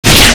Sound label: hit